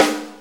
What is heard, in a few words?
Snare B; Sonic Foundry (Sony Creative Software); Drum Tools